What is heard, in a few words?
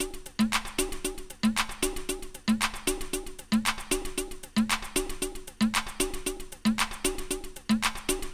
Poppy Electro Loop